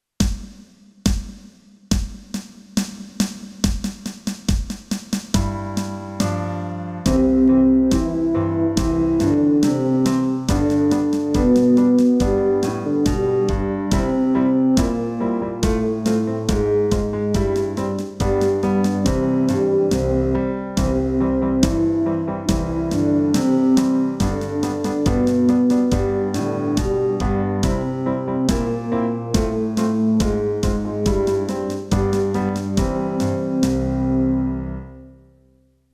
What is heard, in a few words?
Old Duke counter melody Bb, brass